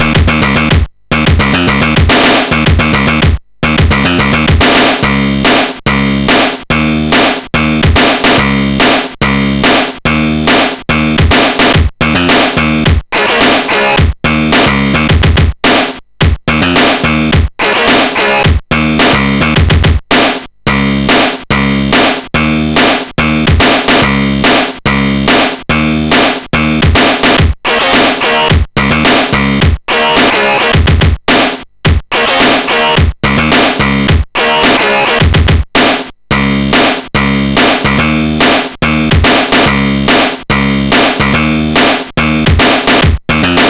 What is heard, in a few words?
Noise sid MOS6581R2; hvsc